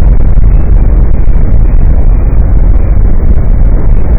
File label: cave rumble; cstrike